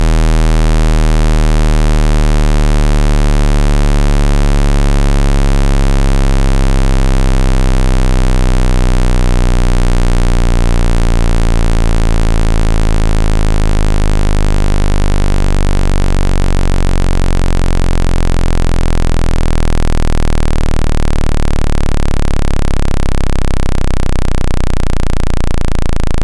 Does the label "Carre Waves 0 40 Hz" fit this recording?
Yes